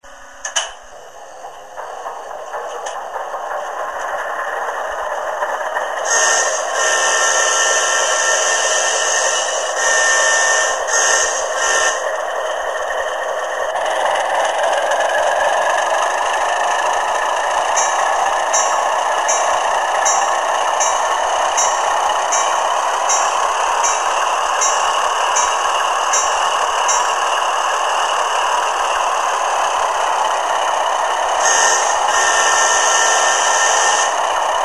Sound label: alco 535